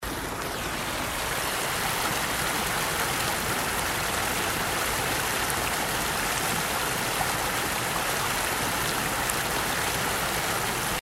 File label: Waterfall